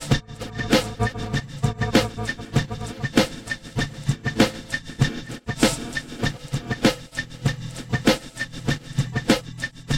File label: Drum